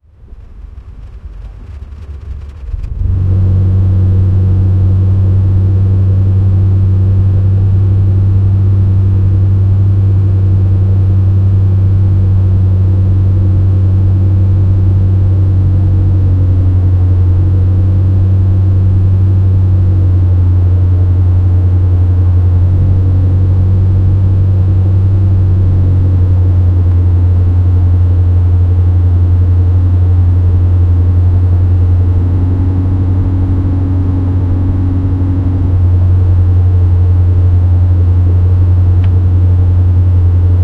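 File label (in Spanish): ruido de carros ford mustang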